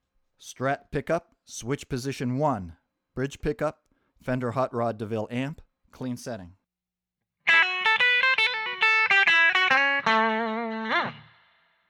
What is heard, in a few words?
strat bridge hotrod clean